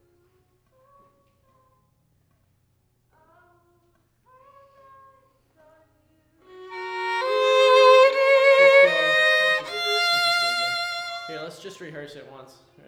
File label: violin